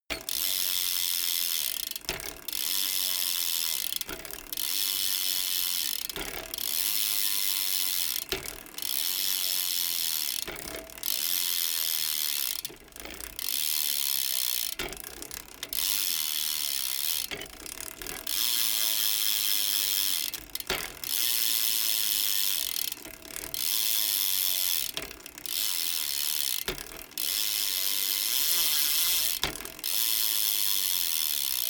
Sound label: bmx bike pedal once freewheel